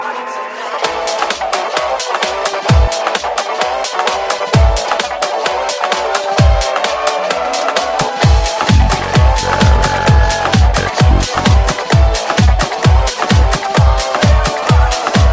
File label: Powerful Bass, ringtone